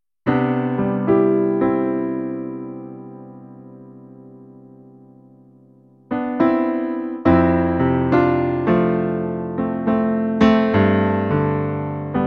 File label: improvisation